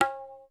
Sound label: TABLA H, SY99; Crypton - DrumBase5000